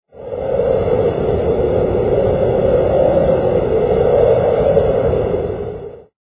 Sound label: air